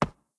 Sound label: Run; blue archive